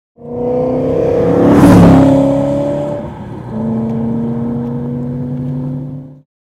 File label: drive by, exhaust systems; borla